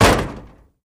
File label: Car Old Rusty Door Sla